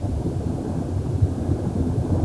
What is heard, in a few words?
player swim